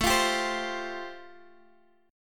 A Minor 7th Sharp 5th A, Guitar, Standard; strummed; x,x,7,10,8,8; capo=2

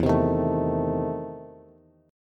E Major 7th Suspended 4th Sharp 5th E down Bass; strummed; JGuitar

